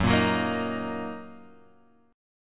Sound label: Gb 6th, Guitar, Standard; strummed; JGuitar